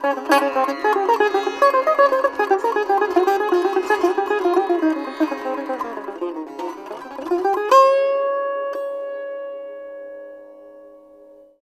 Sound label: SITAR; Spectrasonics; Heart of Asia